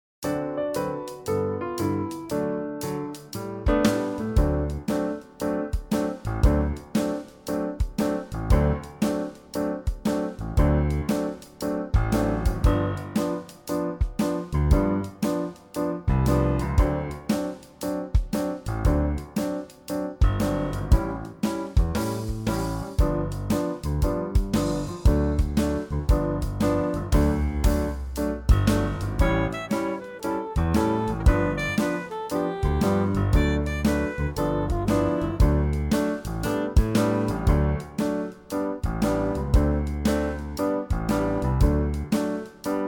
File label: Instrumental